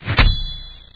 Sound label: sword hit; EDGE